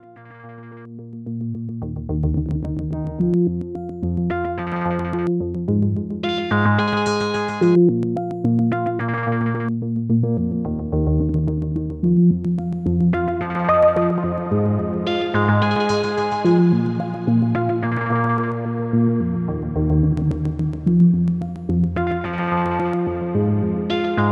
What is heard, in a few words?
Synthesizer Reverb Plate Mercury 7